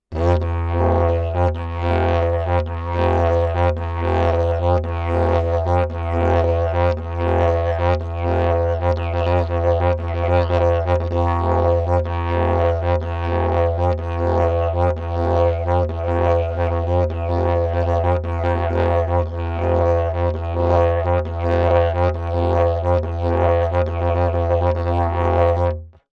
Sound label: didjeridu, solo; mago; how to play didjeridu